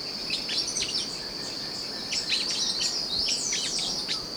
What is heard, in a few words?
Cissopis leverianus variant song